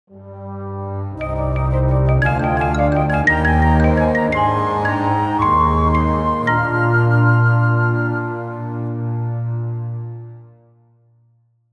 Light sting

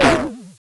Explosion, Retro Game Weapons Sound Effects

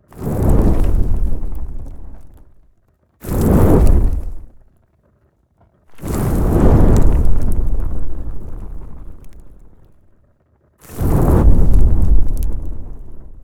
FIRE IGNITIONS MULTIPLE, Fire sound effects

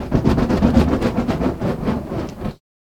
Fire Staff, Fire sound effects

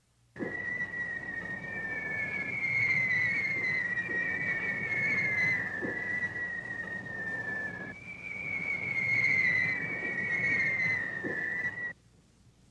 falling bombs whistle

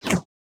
sfx, futuristic weapons sound effects

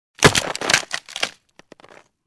crack, Medieval Weapons Sound Effects

